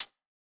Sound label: hihat